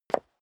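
step high heels concrete single stair down, footsteps; schritte; menschen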